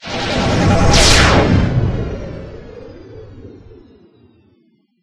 Teleport; life of a naomi; Naomi Carrigan

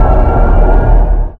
Blind; Life of a Naomi

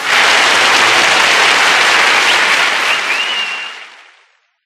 Applause; Life of a Naomi

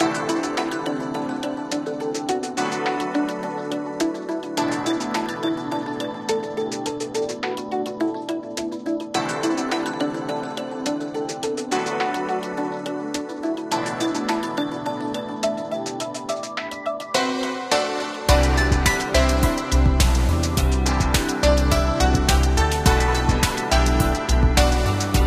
Dungeon; ruu goblin quest

